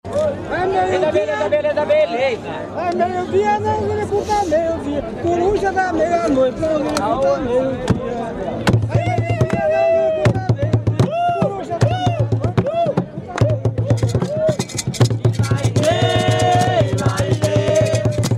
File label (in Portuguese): jongo de guaratingueta, jongo; meio dia